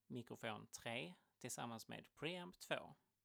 mic pre

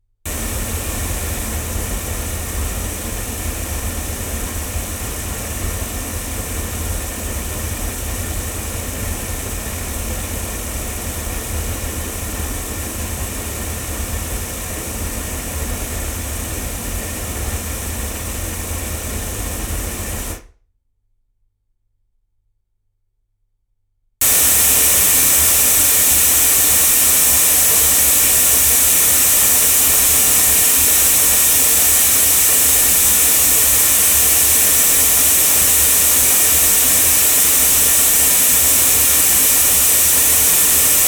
omni loudspeakers

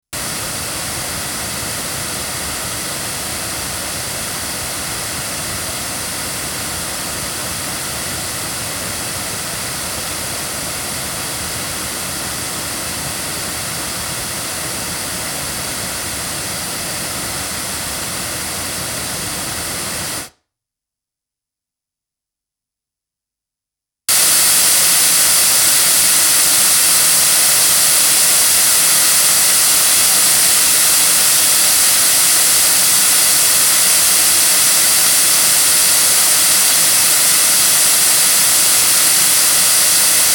Shure Beta58A FAKE loudspeakers

